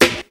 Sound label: studio hip hop snare single hit a sharp key; 90s